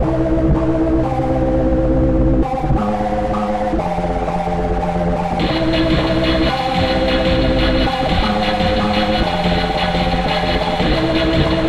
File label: bass